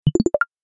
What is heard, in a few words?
Bubbling Up Ringtone; ringtones